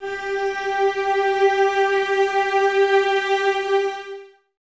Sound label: CES G 4, Cellos, String Ensembles; Miroslav Vitous; 90 s Sample CDs